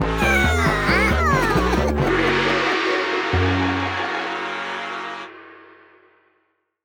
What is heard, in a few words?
Fail